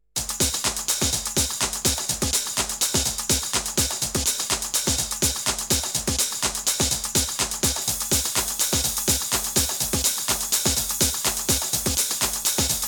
bonus beat

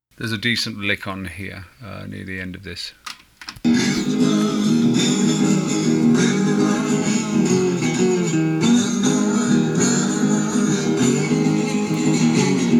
slide blues acoustic; demo

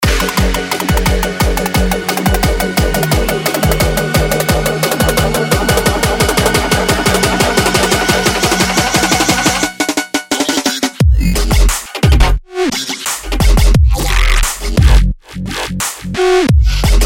trap remix

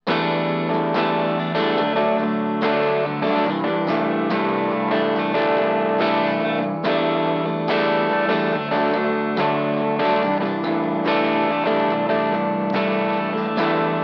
acoustic

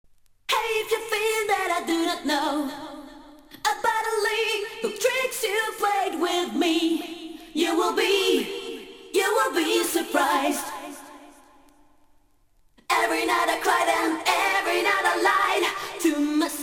acappella version

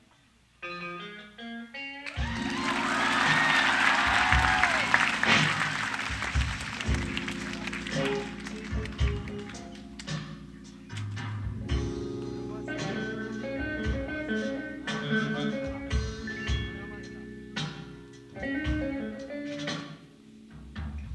drum solo

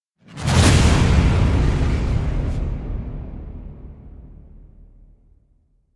destruction slams hull crusher with whoosh

